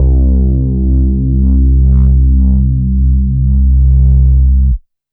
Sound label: bass, yamaha reface cs